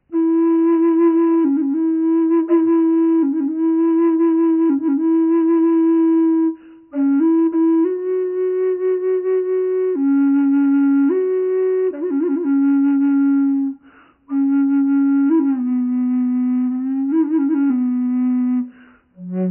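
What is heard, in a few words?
reverb, flute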